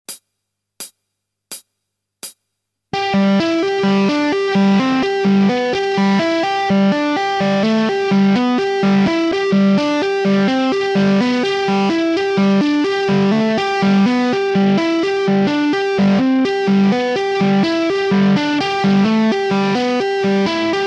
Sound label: Tapping